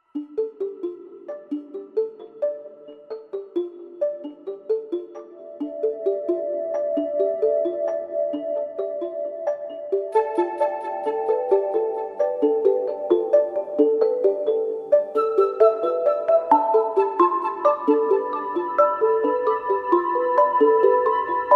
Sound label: Garden Maze No Perc